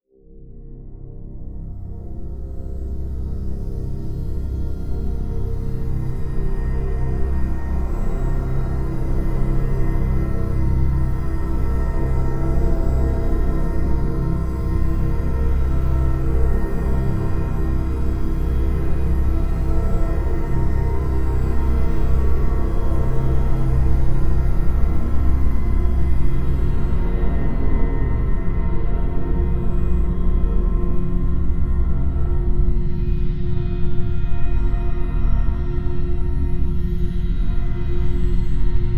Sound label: Bass Soundscapes; OB6